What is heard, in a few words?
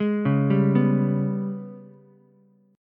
Ab Minor Major 7th Double Flat 5th Ab, Ukulele; arpeggiated; 1,1,3,2; JGuitar